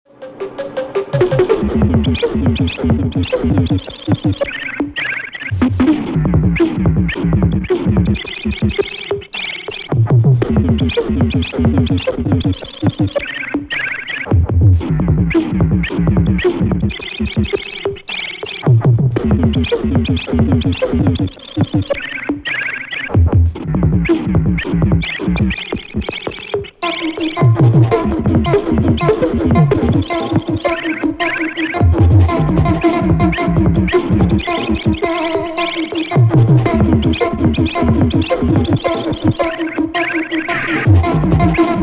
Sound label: weird electronics; Ronet Records